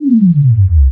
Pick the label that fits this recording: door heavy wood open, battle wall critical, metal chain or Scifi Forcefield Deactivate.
Scifi Forcefield Deactivate